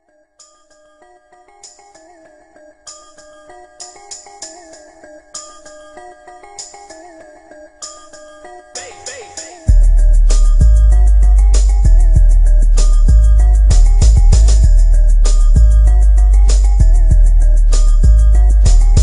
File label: Bass test bass boosted